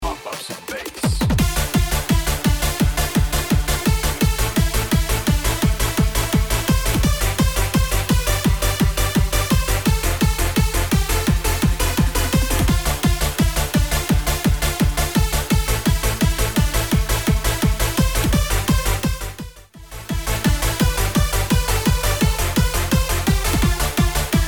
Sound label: PITCH MODULATION